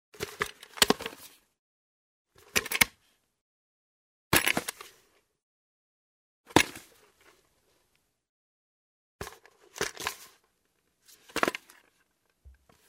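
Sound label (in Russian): шлем пожарника надевают снимают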